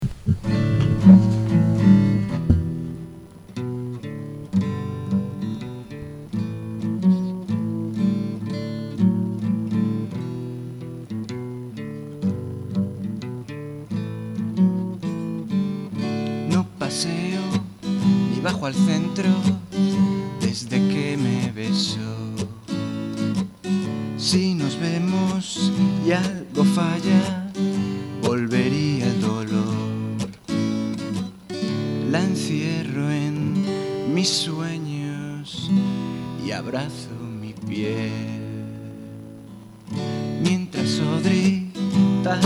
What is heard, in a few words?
acustica